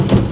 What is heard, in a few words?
gear shift; Rally Xtreme; cars